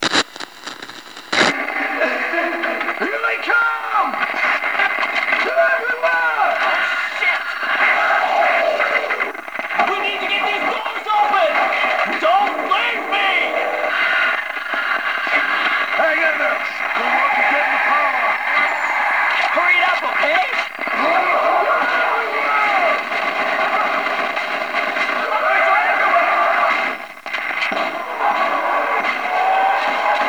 Radio, ambience; zm dust MD

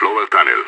lower tunnel; IDF

